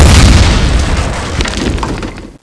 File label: explode